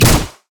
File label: basic attack; riot graves